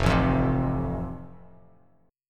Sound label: F Major, Bass Standard; strummed